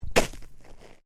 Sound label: FOOTSTEPS SNEAKERS SHALLOW SNOW JUMP STEREO; Walking in sneakers or runners in light snow